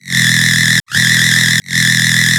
BASS SHOT SCREECH

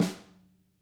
SNAR, Interactive Drum Kits; Sonic Reality; ID SNARE 1+

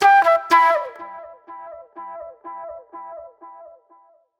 Sharp Delay Flute

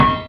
METAL, METAL PERC; Zero-G Ambient 1

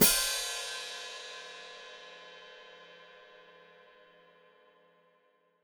Hat Closed Open, Hi-Hat

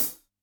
HHCL, Yamaha DD-65, HH

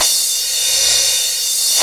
CRASH, DRUMS; Analogon

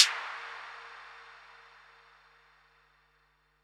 HH, Pearl SC-40 Hihats and cymbals

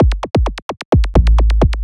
130 BPM, Drum Loops; Zero-G; Club Techno; Drum Set

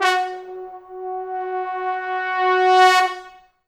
F#3, Pop Brass; Best Service ProSamples vol.19; AKAI

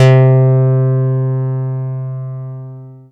Dance Floor Bass, Multisample Basses

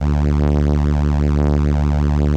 ELKA, String Machines; ELKA RHAP

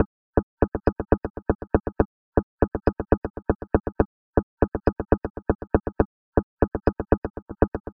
Bongo Delay Beat, Electronic Beats; Sonic Foundry (Sony Creative Software); Myths of Technology

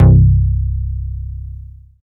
SJUP BASS; East West; Phat & Phunky

